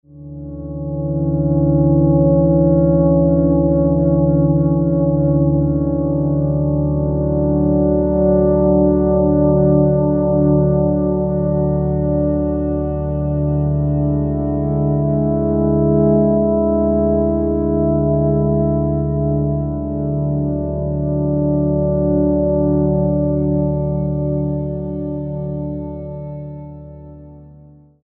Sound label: Sample Music